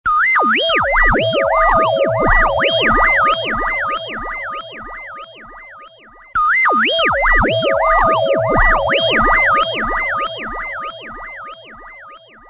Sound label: short siren